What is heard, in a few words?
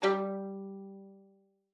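violin pizzicato G5; Xsample VOL-5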